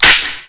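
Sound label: oicw reload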